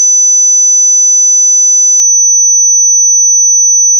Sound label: 6kHz